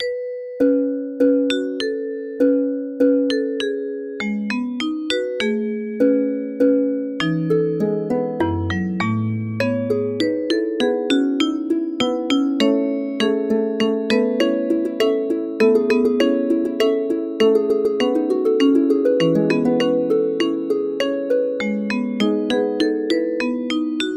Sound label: Vibes and Harp Duet